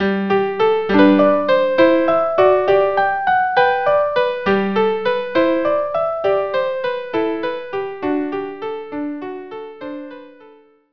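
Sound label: violin unaccompanied; Jesu Joy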